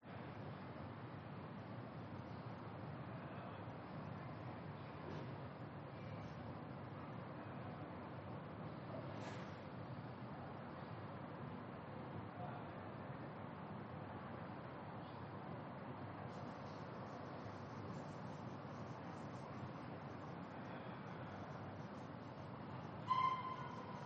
BALCONY Daytime Inner Patio Residential Distant Voices; gain walkers